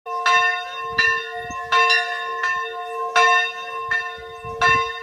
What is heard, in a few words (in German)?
Glockenlaeuten